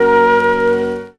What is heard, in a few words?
flute; Winds